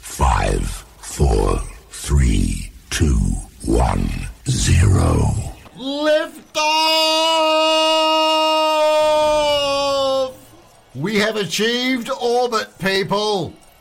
lift off

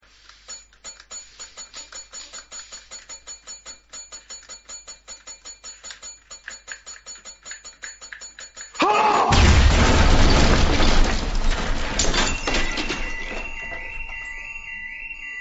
ding ding ding; hector salamanca

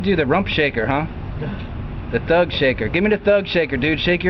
shaker

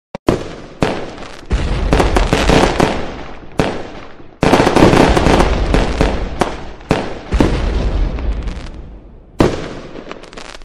explosions and firecrackers

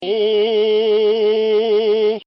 hooooh

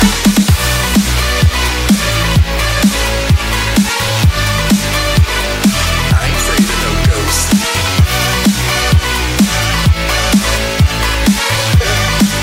ghost boss music

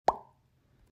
bouncing effect

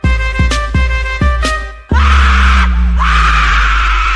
ahhh

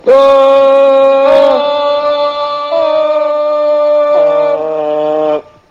danger honk

